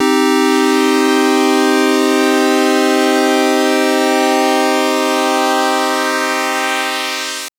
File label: Synth PulseSweep min c3; TrashLibrary